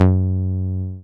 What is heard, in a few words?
303, TB-303 BASS